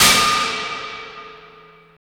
CYBER KIT, DRUMS

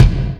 Kick, Drums

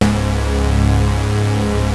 PROPHET 5 G#2, Synthesizer; Hollywood Edge - Giorgio Moroder Rare Synthesizer Collection

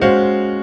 CHORD R, Drum & Bass 140 BPM; Ueberschall; Drum & Bass Flash Trackz

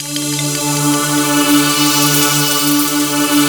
SY99 D4; SY99 YAM; East West; Global Sounds

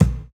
Kick, jungle breaks drum sounds

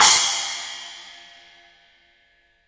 CYMBALS; 3D Sound; 3D Drums

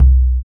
EQ Perc, Percussion Mix

